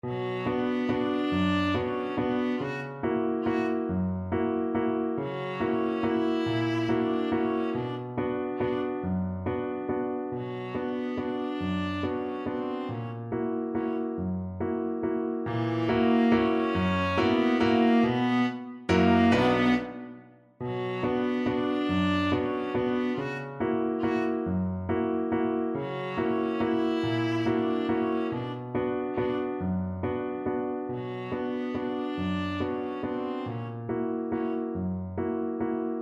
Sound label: viola